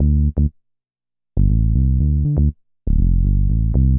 BASS, DANCE; MAGIX

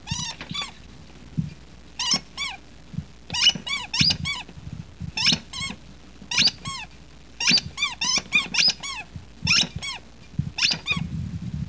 SQUEAK

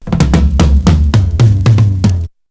TOM ROLL; BEAT2000

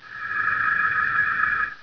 AMB; ABUSE

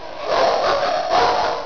DOGS

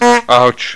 "OUCH"

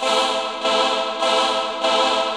KEYB; MAGIX

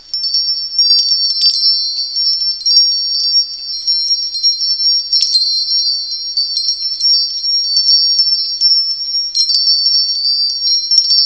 MAGICBL; BELLS

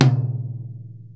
TOM; cubase; CUBASIS; DRUMS

